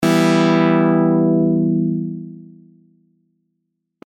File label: env minus